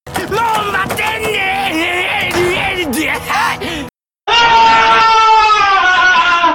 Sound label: aaah; megumin